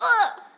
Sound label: Hurt; Jackie